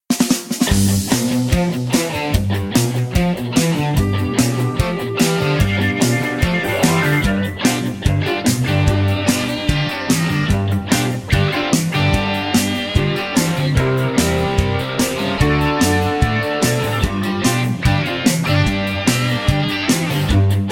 G No Lead Solo No Backing Vocals, backing tracks

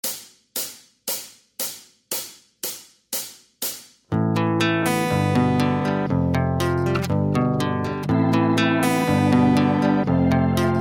(Am) No Backing Vocals

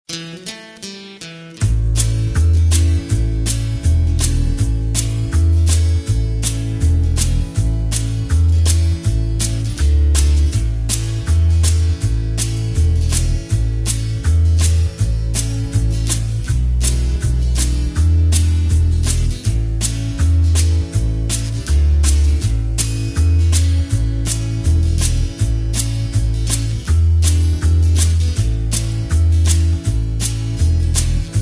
A No Backing Vocals, backing tracks

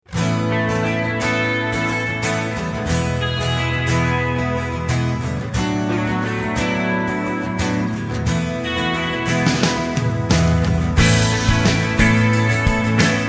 No Backing Vocals, (A)

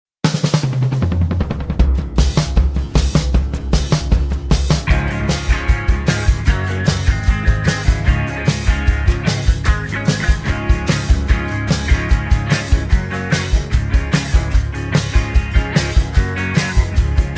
Db No Backing Vocals, backing tracks

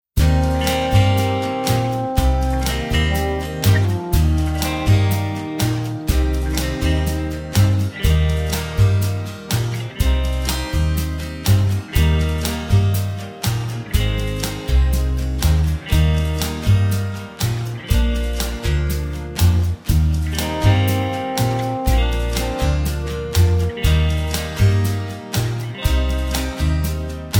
Eb No Backing Vocals, backing tracks

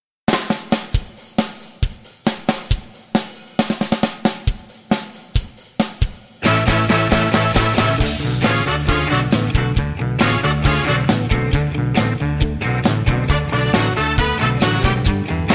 No Backing Vocals, (D)

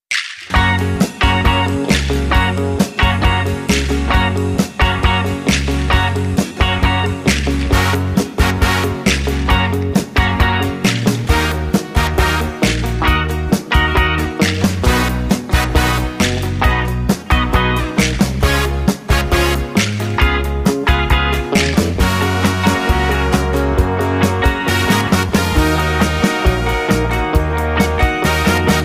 Gb To Ab No Backing Vocals, backing tracks

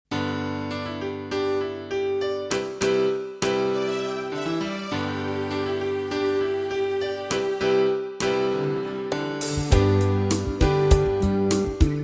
G, backing tracks

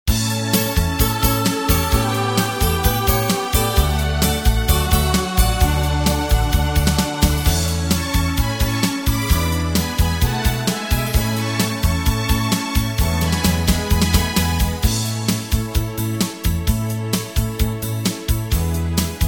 A, backing tracks

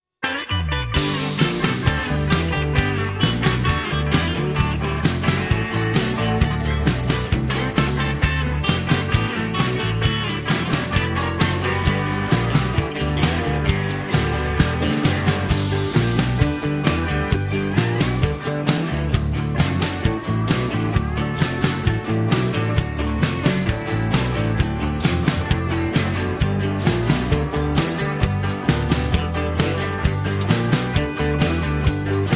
G No Backing Vocals, backing tracks

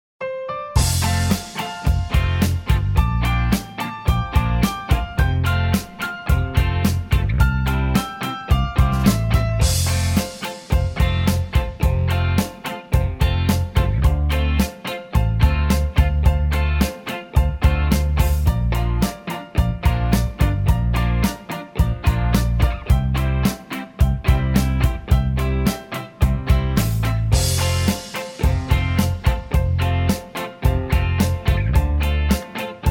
F No Backing Vocals, backing tracks

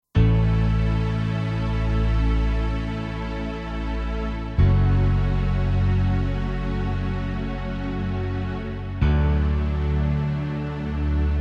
A No Lead Guitar, backing tracks

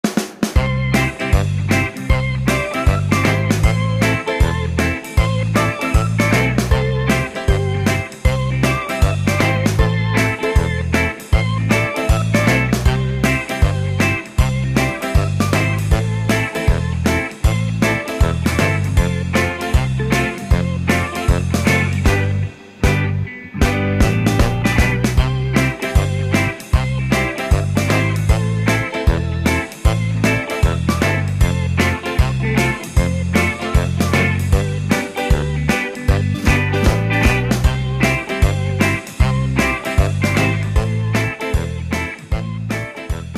C No Backing Vocals, backing tracks

